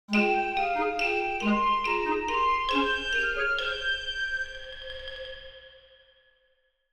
Video game reward chest opening tropical

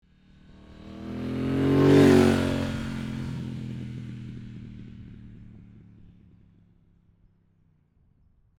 Motorbike pass by medium speed; vehicle

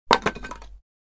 Jenga wooden blocks falling

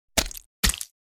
Knife or dagger slide and stab

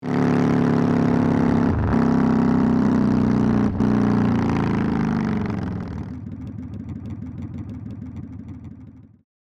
Motorcycle driving decelerating stopping; vehicle

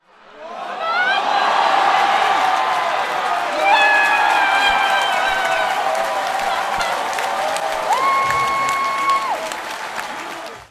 Rugby match crowd reacts to touchdown

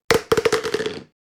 Plastic food container falling impact

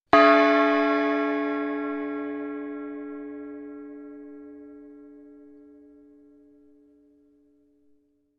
Single gong hit

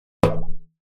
Cartoon accent impact boing

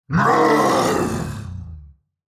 Demon king lord attack shout

